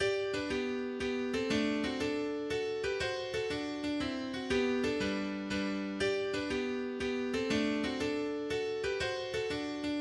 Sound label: Mazurka; Mazurka lin